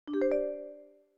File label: Folder Opening; finn's sounds